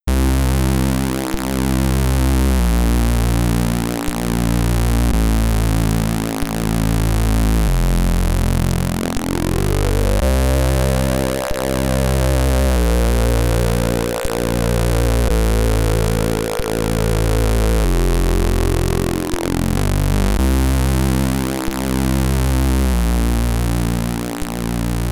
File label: Boss Theme (SID Stereo)